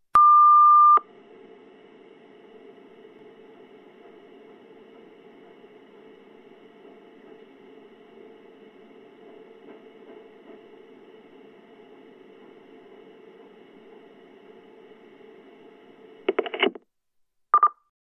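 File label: answering machine signals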